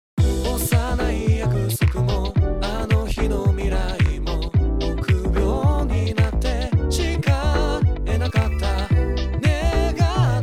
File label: Non Reverb